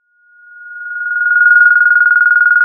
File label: Sound of a phone ringing